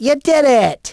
Correct

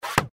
SFX Drag Select Sound

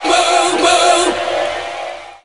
Moo Moo; tempest 4000

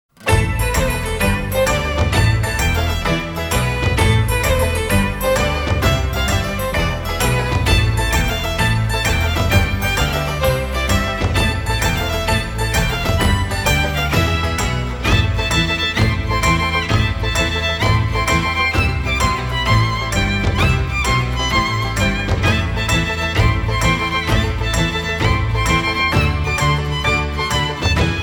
Bazaar; Wild Arms Twilight Venom

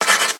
Scratchy Pencil; Angry Birds

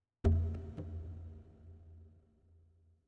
Tribal Drums; Frozen Flame; windows

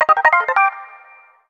Powerup Get; Elebits; Nintendo Wii

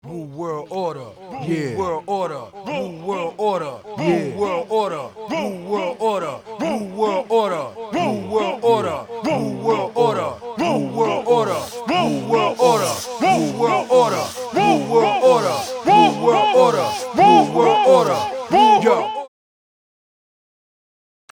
Acappella

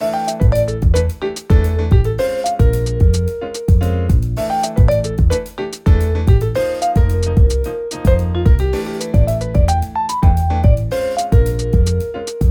BGM Trump Lose; Clubhouse Games: 51 Worldwide Classics

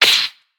Join Hit; boomerang fu

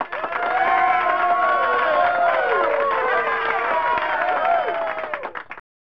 Applause; Hanna-Barbera's Turbo Toons; snes

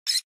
Rat; peace death 2017 pc

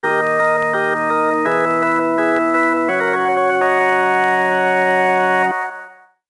Organ

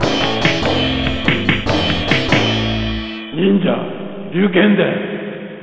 Round Clear; Shadow Warriors; Ninja Gaiden; Ninja Ryukenden; arcade

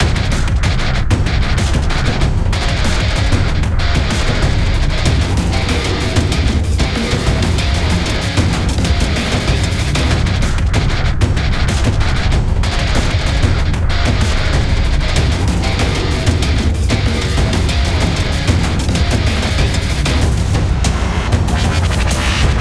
Battle Metro; let it die

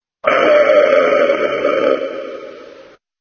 Voices sound (baron death); Red Baron

